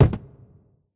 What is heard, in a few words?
phys cube, physics